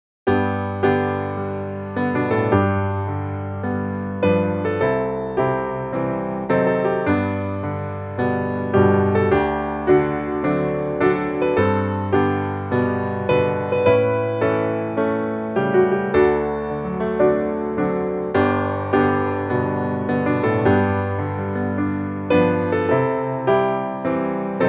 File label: piano